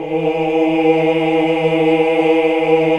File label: OOH, Hallelujah